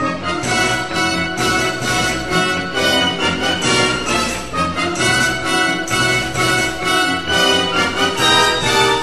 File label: fighting music; star trek